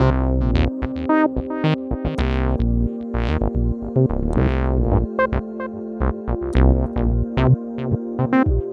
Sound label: Acid Squelch, Bass